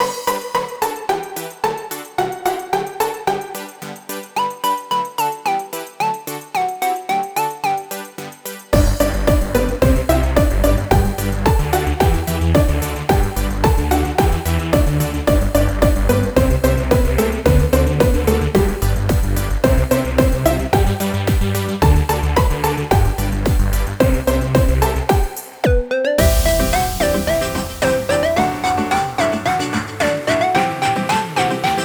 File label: Intro in 90s style